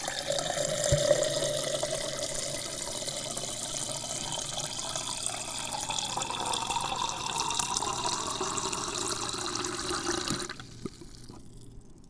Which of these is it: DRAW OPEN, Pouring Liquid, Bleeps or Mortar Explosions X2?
Pouring Liquid